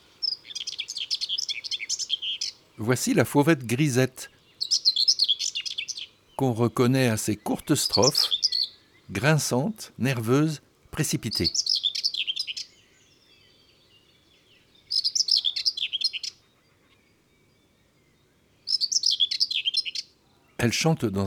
La Fauvette grisette, chants d oiseaux

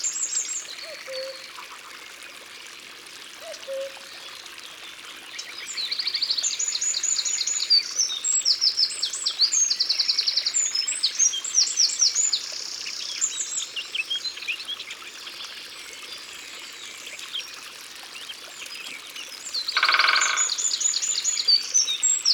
Coucou Gris, Concerts Naturels De l'Ardeche

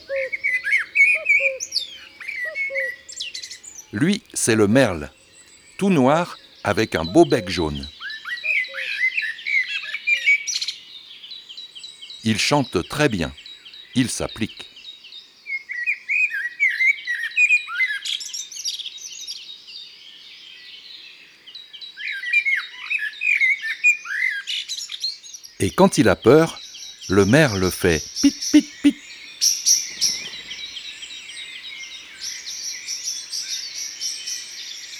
Imagier Merle noir

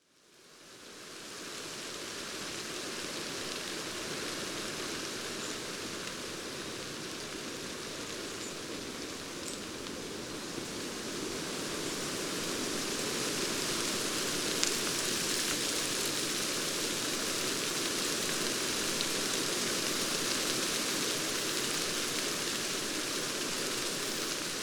Du vent dans les peupliers, Ambiances Sonores d'Ardèche; Chanéac; Studio Les Trois Becs

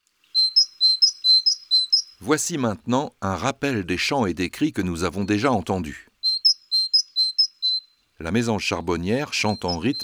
Rappel des chants, oiseaux des jardins

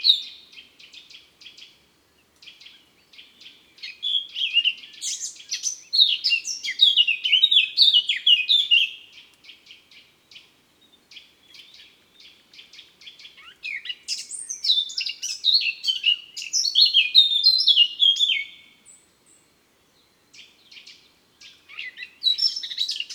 Sittelle Torchepot; Ardeche; Concerts Naturels De l'Ardeche

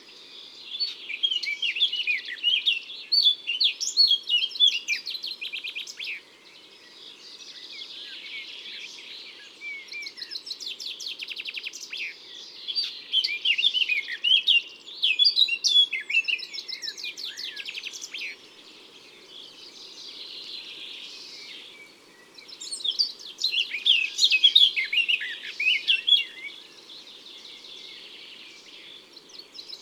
Fauvette a tete noire; Concerts naturels de l Isere; Studio Les Trois Becs

